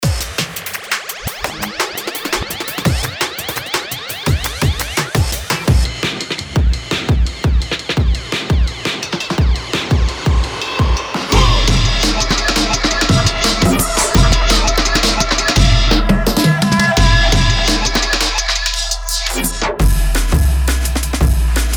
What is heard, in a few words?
rhythm lab uptempo vinylistic